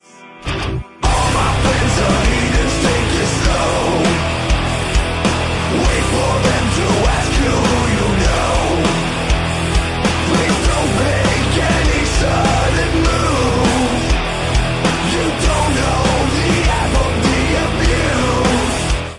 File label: metal